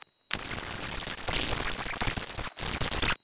vocals

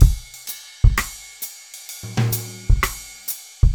064 Jazz Swing Drums; Trad Jazz; Pro Tools Essential Loops; Digidesign

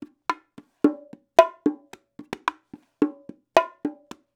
Dance Bongos; 110; Percussion; CongaBongo; Pro Tools Essential Loops; Digidesign; Dance DJ

